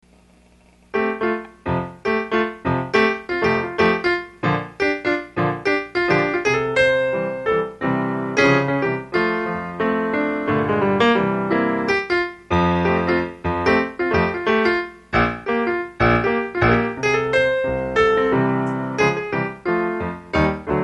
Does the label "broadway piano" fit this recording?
Yes